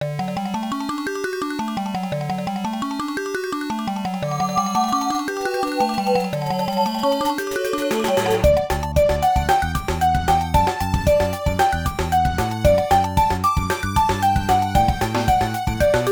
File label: Tone Of Ocean